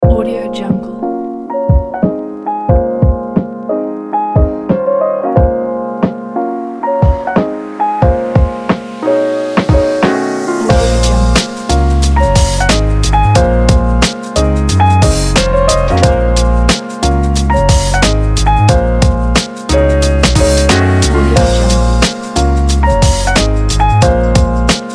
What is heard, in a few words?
jazzy hiphop